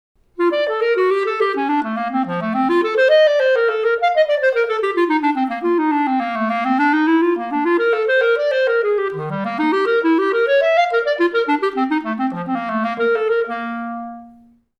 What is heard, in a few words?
Metal Clarinet